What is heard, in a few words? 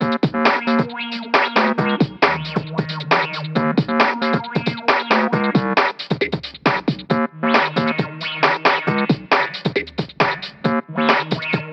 BoomBox loop